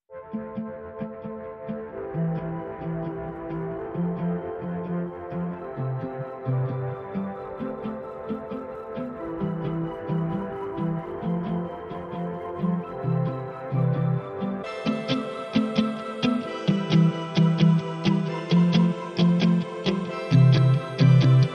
Guitars and Keys Only

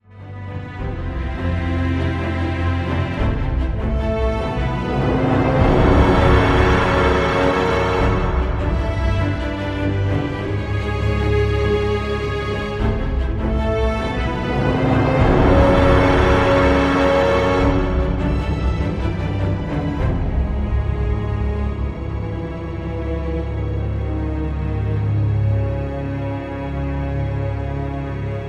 No Choir

